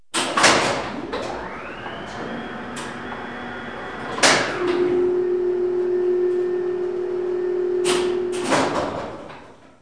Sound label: freight, office equip; KABOOM! - Wild and Wacky Sound Software